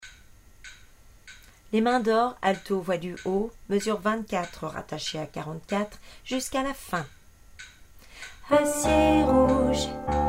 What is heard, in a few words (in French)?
alto